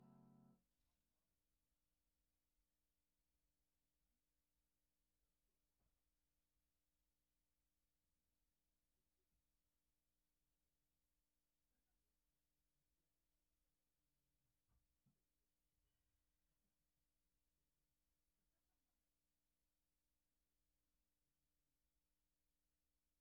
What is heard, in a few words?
GTR R84